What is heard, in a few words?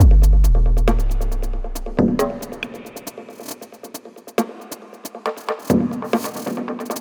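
percussion loop, drum n bass; KJ Sawka - Drum n Bass & Beyond; ACIDPlanet Remix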